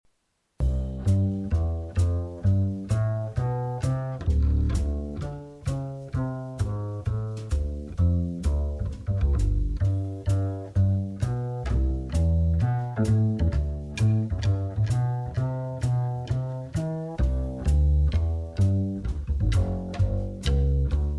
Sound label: Backing, jazz